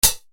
MANU HAT, Yamaha RY-30

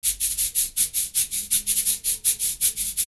Live Perc Riffs; Jungle Frenzy 1

